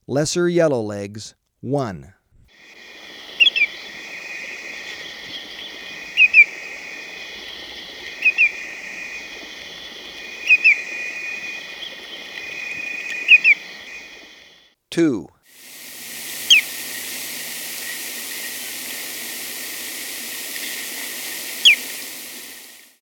Lesser Yellowlegs; S. America

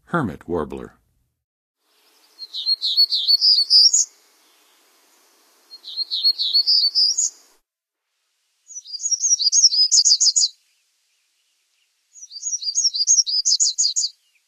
Hermit Warbler, Songs of Birds of North America; Western USA; Western USA Songbirds

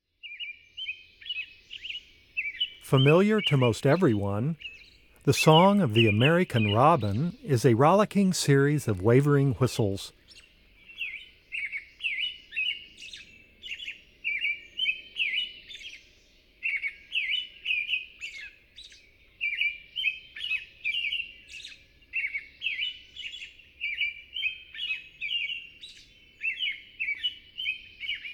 American Robin, Bird Sounds; North America; Common Western Species; Know Your Bird Sounds

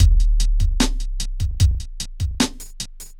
hiphop

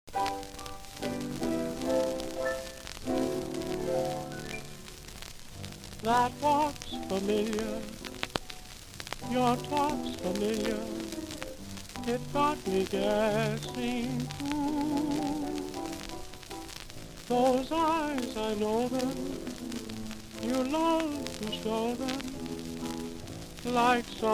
Orchestra vocal; Jazz; 1931; Popular Jazz Archive 1920-1936

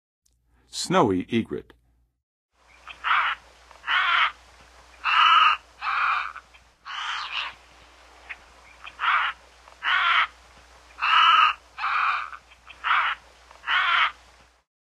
Snowy Egret; North America; Western USA; Western USA Songbirds

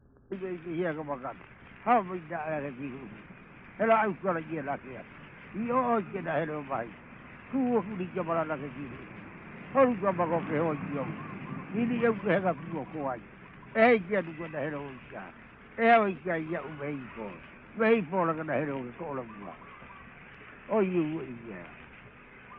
Name chant, Hawai'i; World Music; Hawaiian; for Kamehameha I; Na Leo Hawai'i Kahiko - Voices of Old Hawai'i

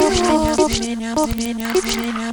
Vox Glitch; Sound Effect Library; Ambient Cinematic; Vox Loops

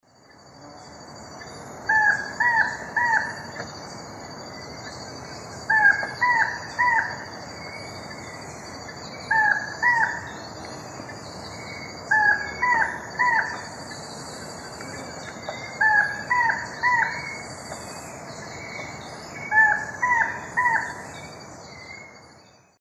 Micrastur gilvicollis, song; S. America; Eastern Lowlands

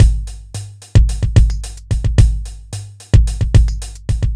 r n b kit 110

